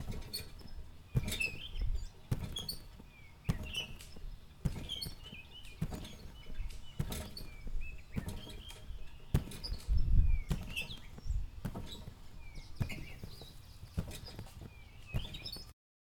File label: Tranpoline Jumping